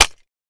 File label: Mouse Trap Snap